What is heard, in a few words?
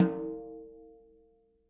SN OFF, snares; Sampleheads; Peter Erskine Living Drums